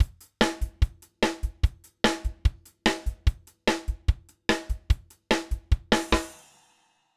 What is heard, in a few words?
STRIKE POLICE